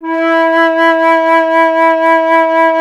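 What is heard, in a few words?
FLUTE FLUTE E 0; Roland LA; ORCHESTRA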